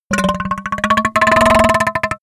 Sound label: goblin fart, comedy cartoon; soundpool